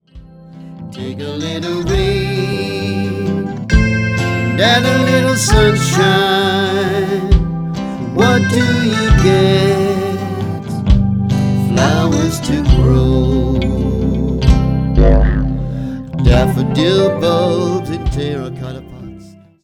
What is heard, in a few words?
DAFFODIL SONG